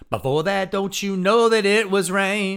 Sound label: vocals